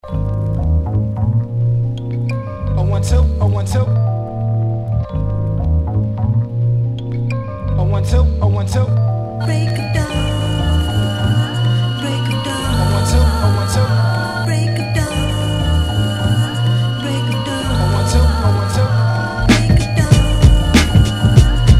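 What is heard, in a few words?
hip hop, Vocal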